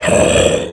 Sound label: death furbolg ursa, creep deaths; dota